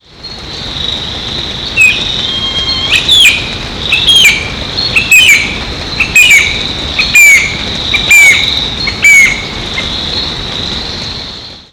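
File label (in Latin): Xiphocolaptes albicollis; Itatiaia NP; Brazil; Silveira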